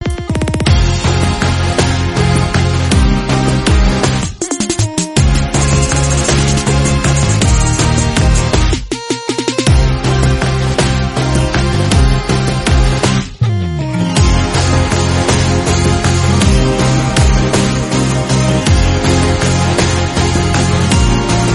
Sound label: song bgm; Bogan film